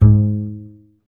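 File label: contrabass G; Miroslav Vitous; Solo Instruments VOL-2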